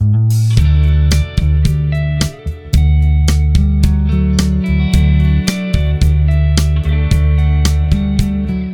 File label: Peaceful Vignette